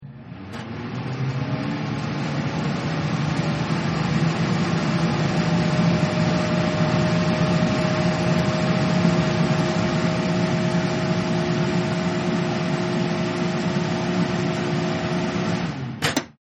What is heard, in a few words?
Bathroom Fan Runs For About 15 Seconds And Gets Turned Off; Fans; Electronics